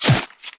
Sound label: pirate skel attack